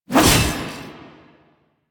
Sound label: Whoosh F; Tatsu Prime; Revenant Nikana